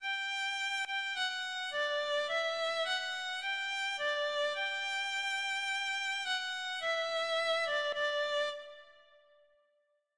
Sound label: violino I; HWV259